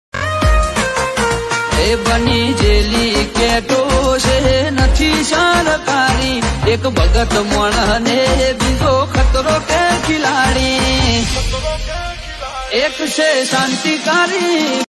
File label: Ringtone